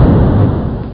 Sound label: bang Medium